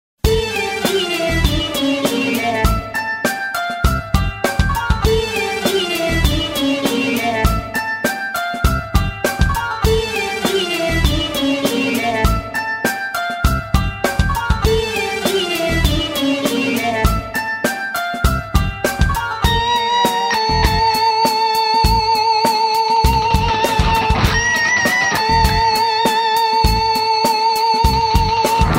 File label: bells